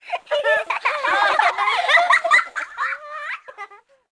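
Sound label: kids laugh, residential; PC Gamer IT CD 40; zones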